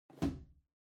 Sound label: Cardboard Box Far Put Down; ダンボール; 遠い 置く; 梱包材